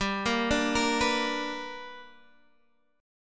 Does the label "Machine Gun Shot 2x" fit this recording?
No